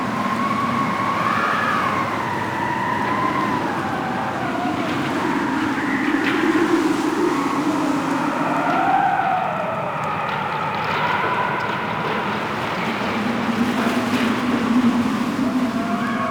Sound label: Shipyards Exterior Wind Ambience; Warframe; Grineer Shipyards